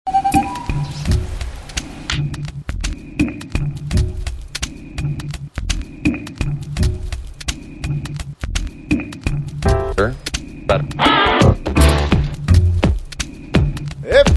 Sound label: reverse charge blues